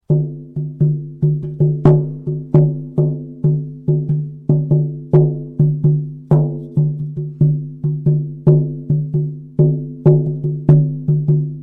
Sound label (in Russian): buben, khakass; buben khakass chark 35